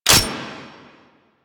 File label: Sniper Mech; DE; Warframe; Warframes; Frumentarius; ExaltedSniper